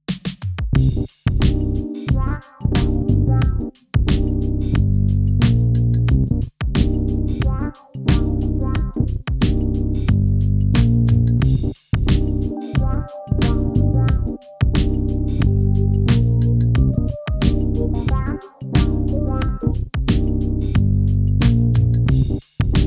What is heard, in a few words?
neo soul fun